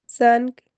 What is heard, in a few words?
bell